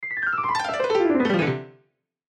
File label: Piano Gliss, Piano Gliss Descend; Pianos; Musical; Blastwave